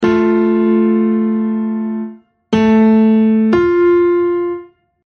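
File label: minor sixth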